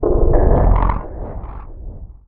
Ambience Short; Empyrean Ambience 04 Short

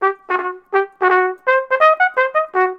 Musical bugle reveille; lego star wars the force awakens 2016 ps4

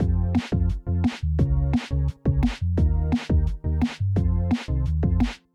Win Loop Dnb; Cyber Hook

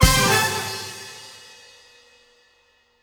Disco Stinger Stab; Plants vs. Zombies Battle for Neighborville

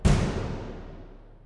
Tymp

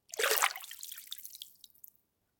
Shallow Water Movement; children of morta; Tutorial

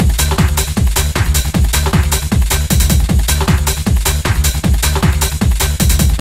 failed; Sound Volblox IV Radiant Blue Plus

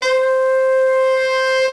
Sax tone; lego my style preschool

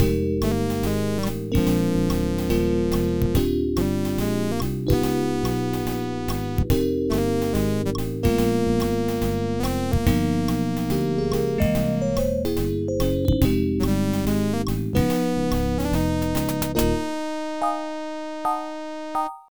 Saxy; Leisure Suit Larry 5: Passionate Patti Does a Little Undercover Work; IBM PC AT

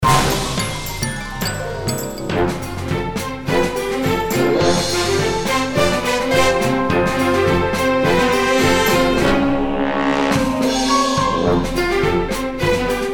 Opening music; Charlie and the Chocolate Factory

